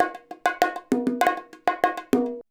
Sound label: BONG, BONGO 100, Modern Percussion Loops